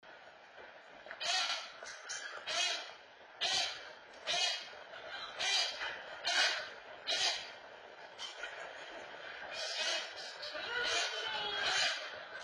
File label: Binky yelling